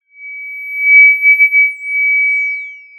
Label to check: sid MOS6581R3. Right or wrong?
Wrong